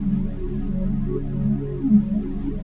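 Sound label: Pad Synth; Equipped Music; Loungin House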